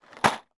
industrial plastic box of drywall screws put down on floor